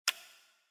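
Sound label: Button Slider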